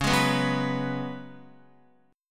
Db 7th Suspended 4th Db down Guitar; JGuitar

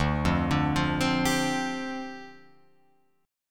D Minor 11th D down Guitar Open F; Dm11 arpeggiated

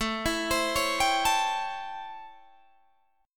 A 7th Sharp 9th, Guitar, Open D

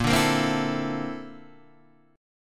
A sharp Major Sharp 11th, Guitar, Half Step Down; A#M#11

